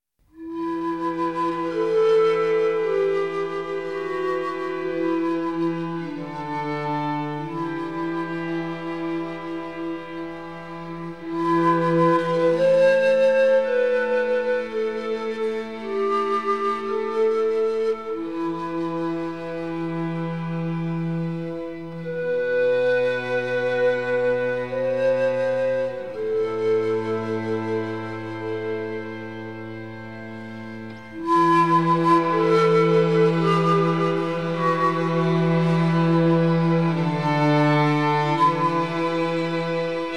Lament; Civ5; War; Old Woman's Lament

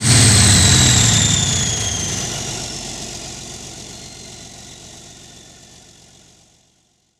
L2 engine acc end; DCS

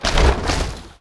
objects, World of Tanks

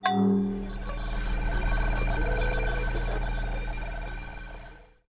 Broadcast Tower; Civ4 warlords; Buildings

